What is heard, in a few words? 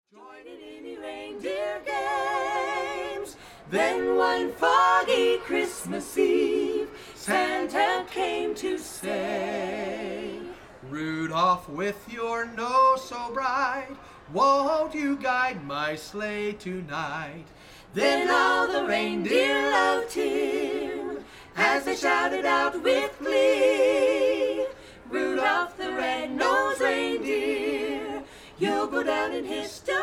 Carolers